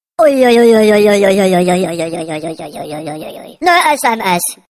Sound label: Ui Ui Ui Sms